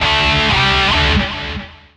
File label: Pickup Guitar Riff; SFX Kit; Pickups